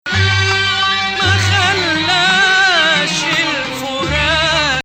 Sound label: Bayati Hijaz, maqam